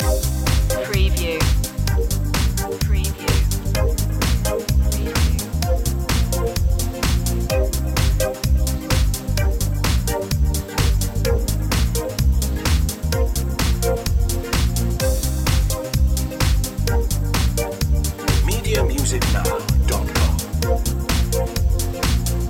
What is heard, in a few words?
up to this beat loop